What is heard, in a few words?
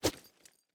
fist swing; physics